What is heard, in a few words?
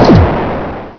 MISSILE